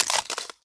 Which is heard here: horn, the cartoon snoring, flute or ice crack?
ice crack